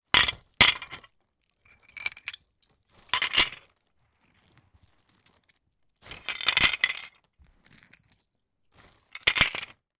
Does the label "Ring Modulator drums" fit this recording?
No